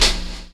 HIHAT; E-Lab; X Static Goldmine 2; akai

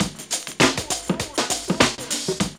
LOOP, DRUM; E-Lab; X Static Goldmine 2; akai

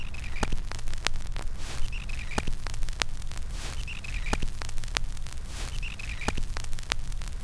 CRACK; E-Lab; X Static Goldmine 2; akai

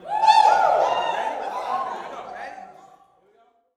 "Woo", Vocal Gospel yell shout; Spectrasonics; Vocal Planet Vol.1 Gospel; akai

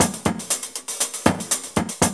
LOOP; E-Lab; X Static Goldmine 2; akai

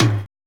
TOM

